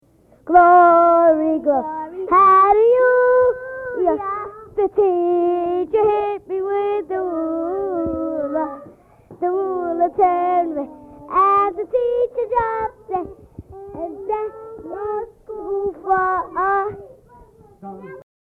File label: singing Battle Hymn of the Republic